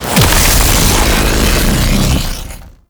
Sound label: Lightning Stomp Land; Warframe; The New War; Archon; Boreal; Abilities